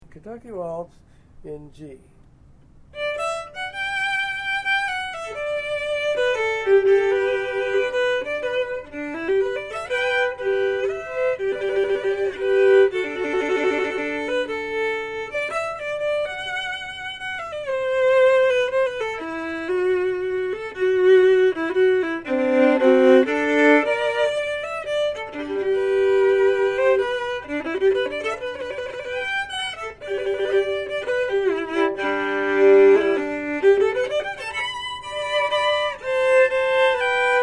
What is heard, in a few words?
Waltz G, Fiddle; Fiddle chords